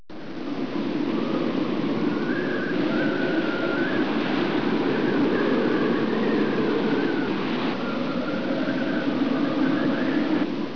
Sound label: snow ambience; de russia